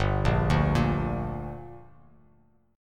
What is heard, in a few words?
G Minor G, Bass Standard; arpeggiated; 3,1,0,3; JGuitar